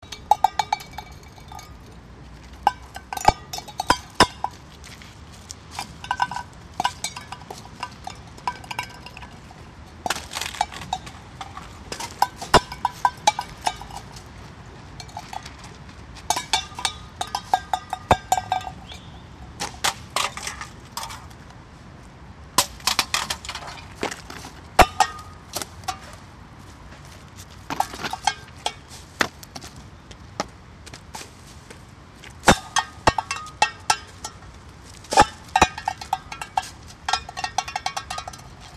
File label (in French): Boites de conserve